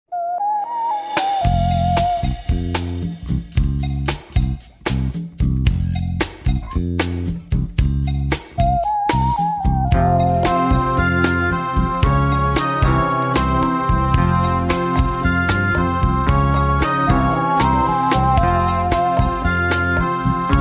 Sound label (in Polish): delikatny dzwonek